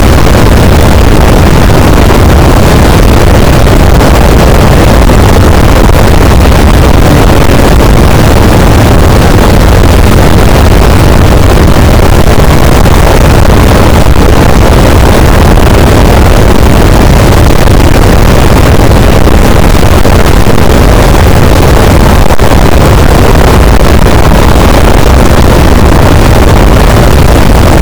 cachalot negatif, boat, Physeter Macrocephalus

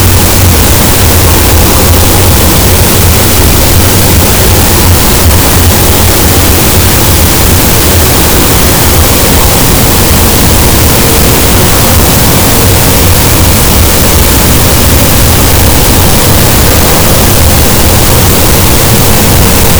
cachalot, gaussian noise; Physeter Macrocephalus

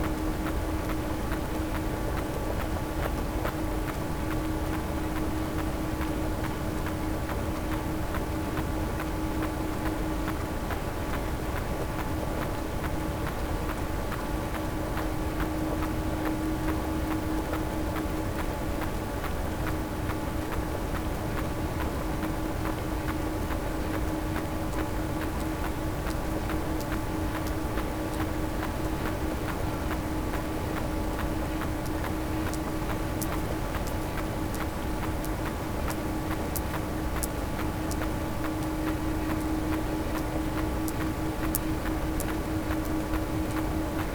cachalot positif, Physeter Macrocephalus

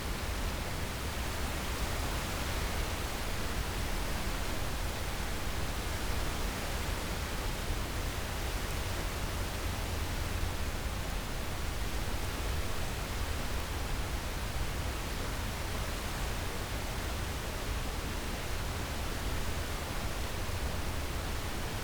cachalot negatif, Physeter Macrocephalus

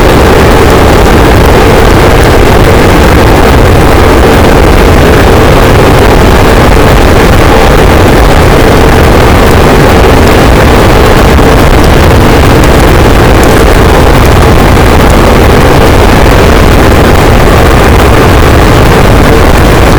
cachalot positif, boat; Physeter Macrocephalus

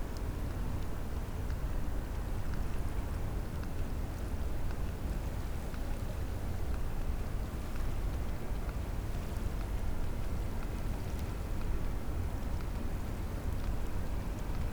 cachalot positif, Physeter Macrocephalus

